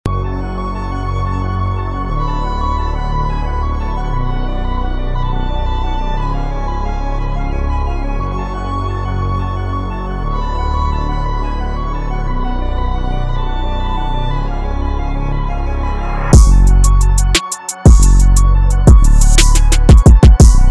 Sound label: analog lab presets; deceive